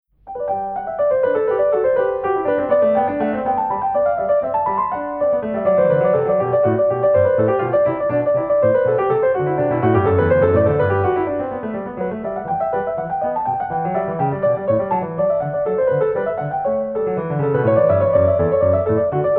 French Suite G Major Courante; J.S.Bach; BWV 816

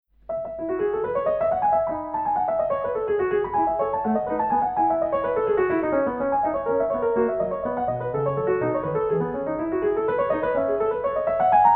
French Suite E Major Courante; J.S.Bach; BWV 817

